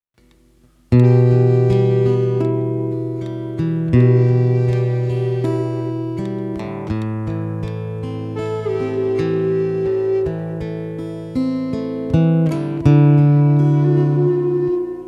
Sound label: Russian Vibe Pipe Mix